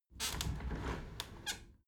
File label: window handle squeak turn open halfway soft; objekte; fenster